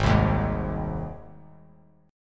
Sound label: Ab Major Ab down; strummed; JGuitar